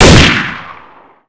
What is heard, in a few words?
m4a1 unsil 2, weapons; Counter-Strike Pack